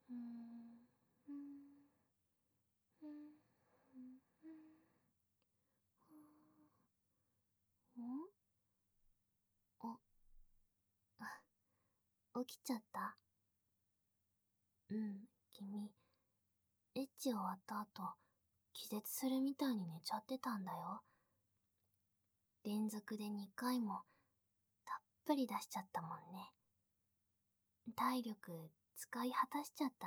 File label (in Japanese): 添い寝、キス、寝かし付け、寝息; ASMR; SE無し